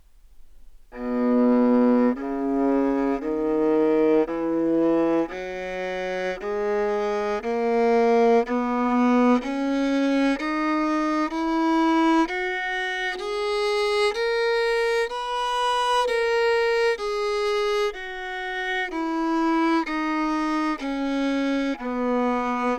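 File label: baroque viola scale bow, Anechoic; OPENAIR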